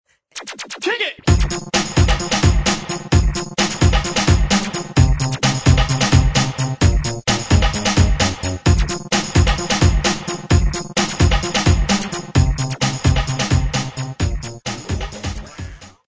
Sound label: bass